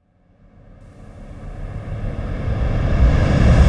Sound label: Dark Riser Buildup